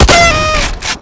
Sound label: confetti shotgun; garrysmod